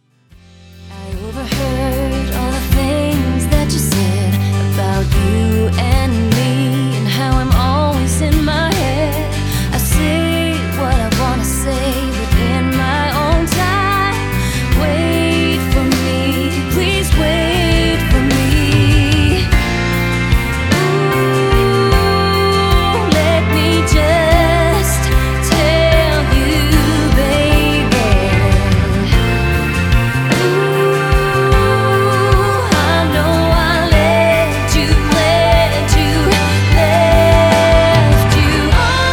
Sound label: Pop Country